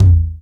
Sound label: GROOVE TOM, Drums Dance & Groove; USB Soundscan vol.11; AKAI; GROOV KIT